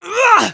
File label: taunt flip exert; UHRAH!; Scout